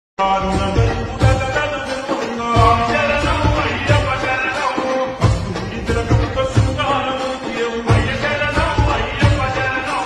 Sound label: bhakti bgm ringtones bhakti music; ayyappa; bhakti whatsapp status